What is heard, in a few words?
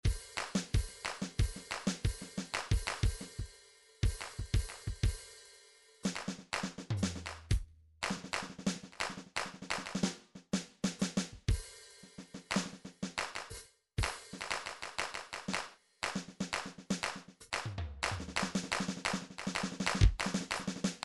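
midi drums percussion fill; midi vol 4 drums percussion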